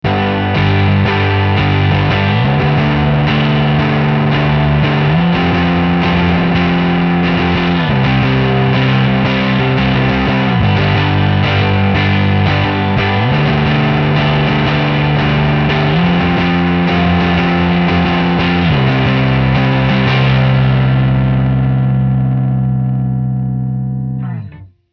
Shimmer Dry

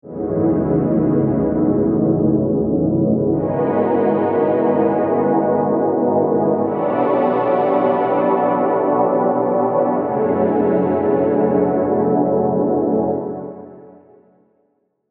Freeze Drone Sampler

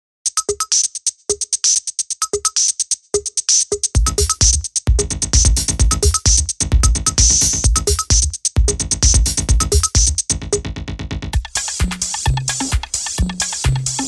drums

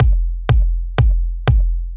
multiband kick

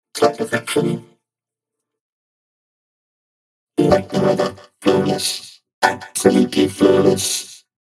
vocoder

